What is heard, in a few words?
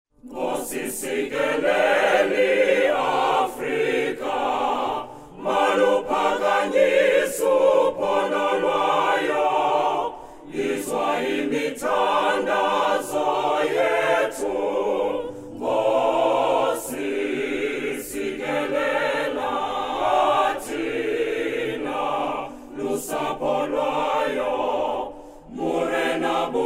national anthem sung acappella